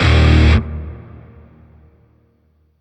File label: Shawzin Blues Chord B; Music; Warframe; Grineer; Chords